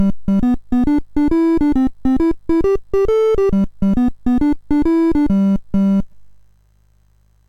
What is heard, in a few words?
MOS6581R2, GAMES; Sammy Lightfoot